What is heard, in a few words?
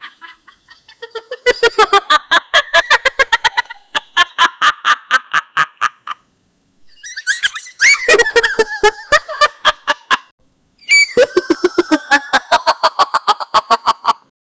funny sound spring